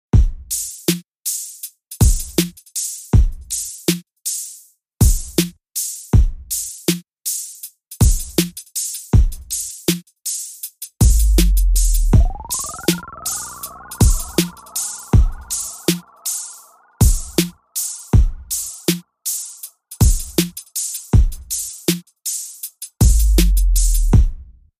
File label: String It Beat